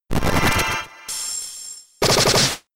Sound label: Payback; Pokemon; Gen 5; Blk Wht Blk2 Wht2